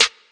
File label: urban trap snare; Urban Trap Kit; Hip Hop; STYLE KITS (Hip Hop, Etc)